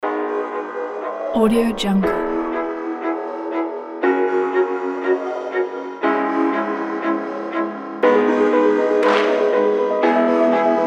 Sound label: dramatic sport intro action trailer kit